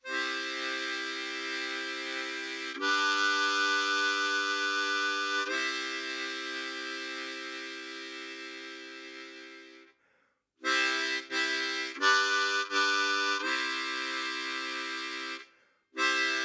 Chords Lower Octave Tonic & Dominant, Blues Harp

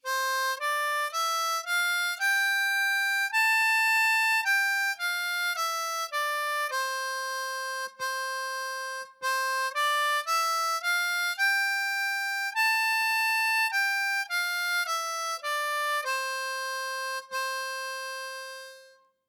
Single Note Exercise, C Major, Blues Harp

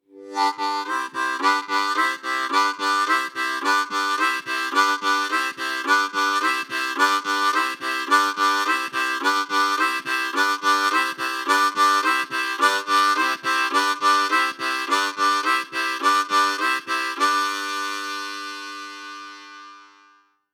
Cross Harp Rhythm

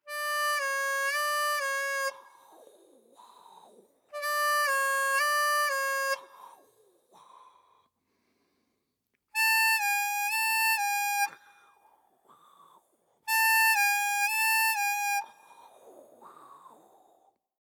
Bends In 4- & 6-Draw, With & Without Harp

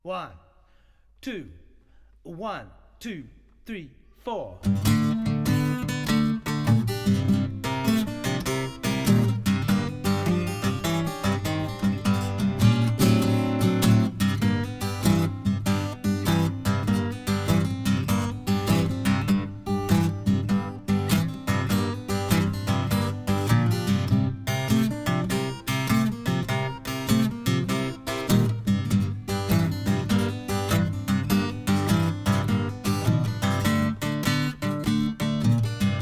Guitar Playback

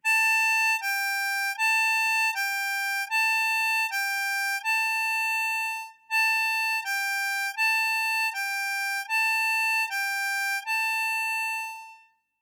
Single Note Exercise, Hole 6, blues harp

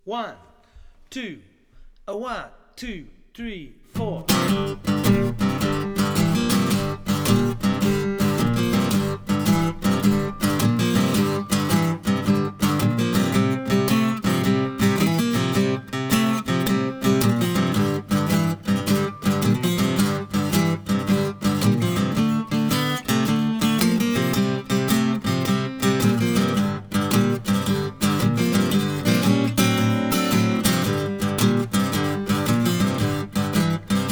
Guitar Playback

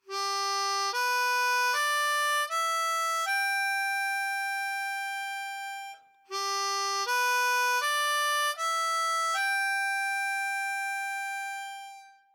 Cross Harp Exercise

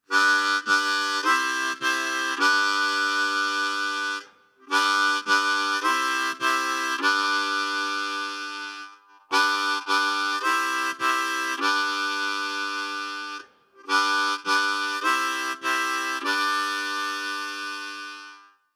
Chords In 2nd Postion ('cross Harp')

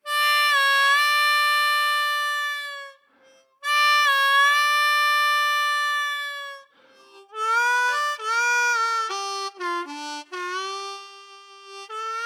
Various Bends, Blues Harp

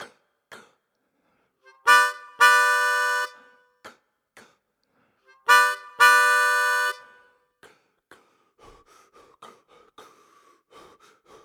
Harp riff Played With 'kah'; Blues Harp

